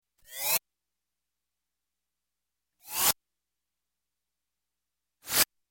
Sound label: Space Space Stab, Electronic; Sound Ideas 2000 Series